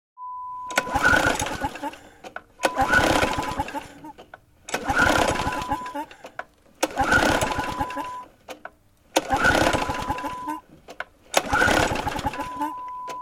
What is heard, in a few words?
Pull Cord